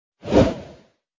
swoosh

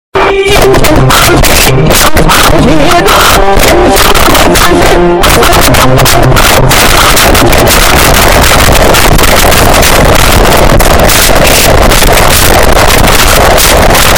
chinese rap super boosted

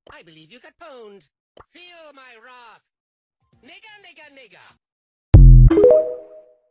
hehe; bs mortis new voiceline rick

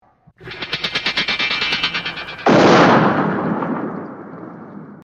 steel door strike

